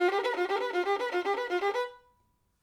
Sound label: violin